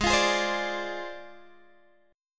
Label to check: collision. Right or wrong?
Wrong